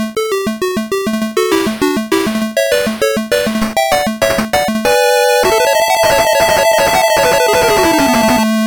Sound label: Slot Machine Jackpot; Casino Games; Master System